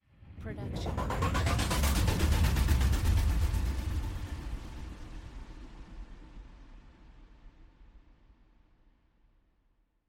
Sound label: Gated Lifter; soundscrate